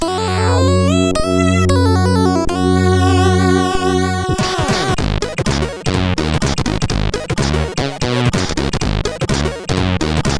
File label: Funk sid CSG8580R5